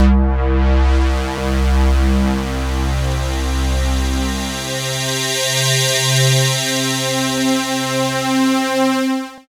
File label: LEAD C4, SYNTH, Trance; AMG; Global Trance Mission VOL-2